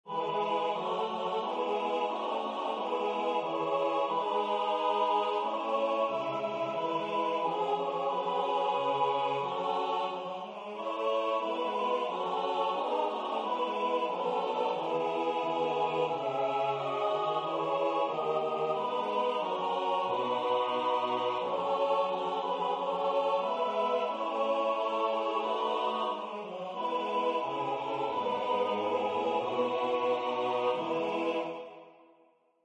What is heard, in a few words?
SATB; Danmark, nu blunder den lyse nat